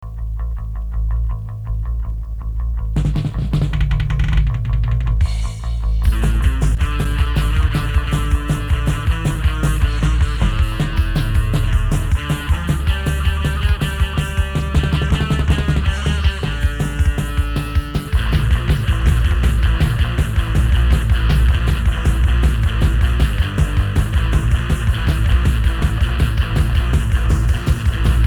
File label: power instrumental